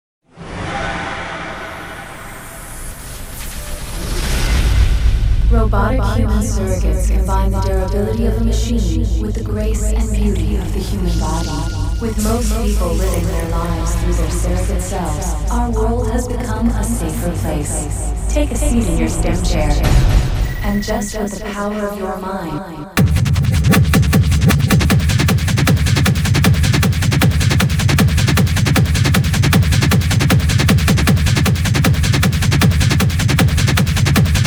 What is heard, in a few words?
HardTechno